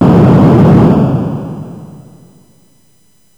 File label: explo; battles